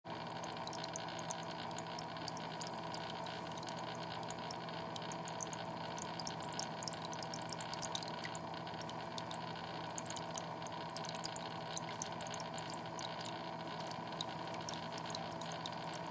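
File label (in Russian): работа фильтра для аквариума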